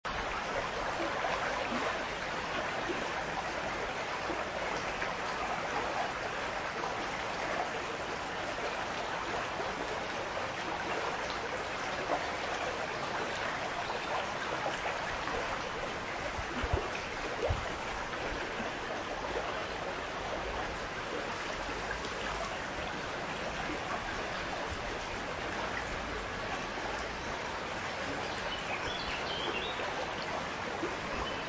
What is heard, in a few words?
babbling brook stream sounds; 24 minutes; high Gorge Walk in Cheddar, Somerset